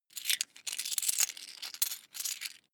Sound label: coins big clatter